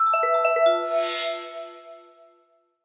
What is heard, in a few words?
cat disappear; sfx; cheshire Cat